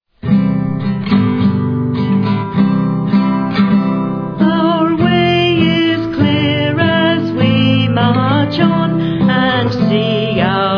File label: World Song English with G; WAGGGS